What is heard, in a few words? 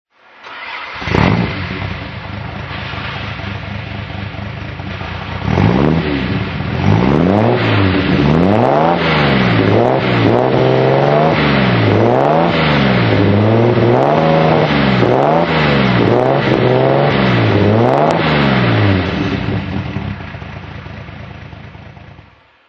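austin healey 3000 throttle; deniswelch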